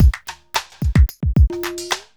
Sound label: evil beats